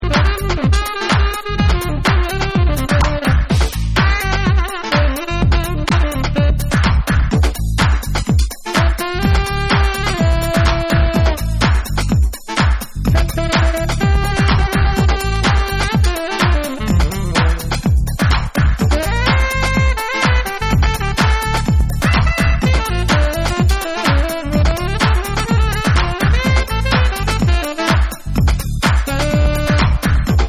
Club Mix

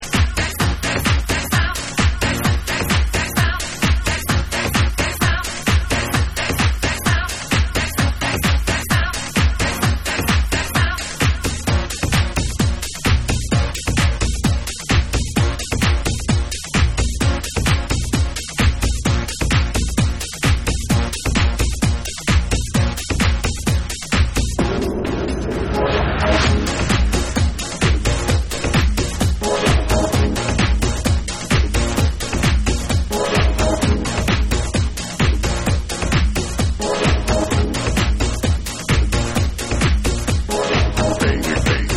THAT SOUND Masculine Dub

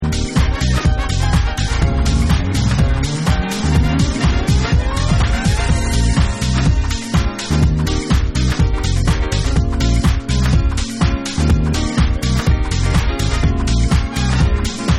Saxy Instrumental

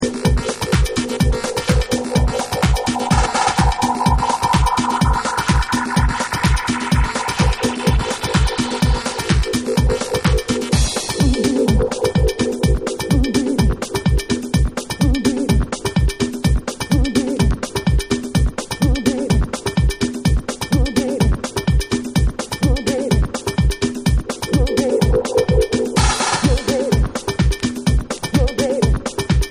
Dub

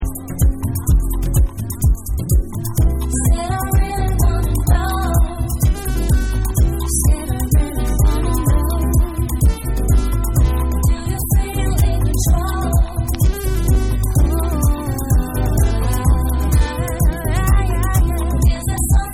Vocal Mix

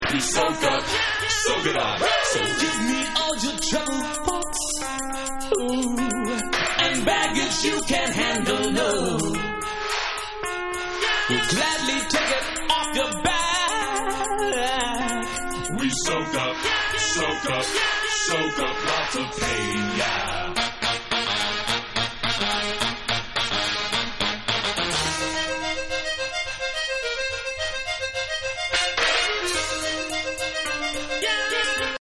Acappella Version

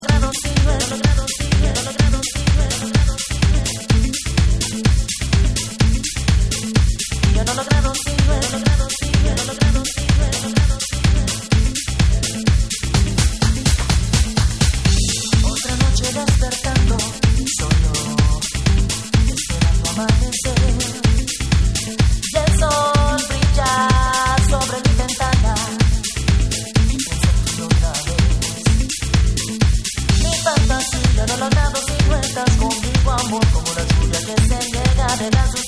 Latin Rhythem

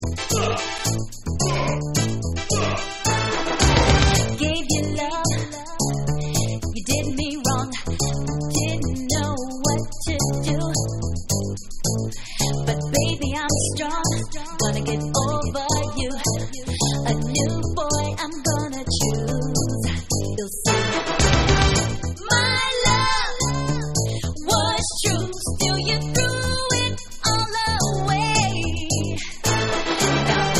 Acappella

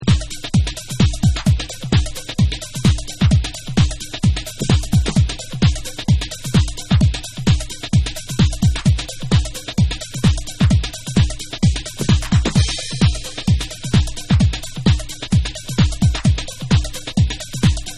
DRUMMAGE

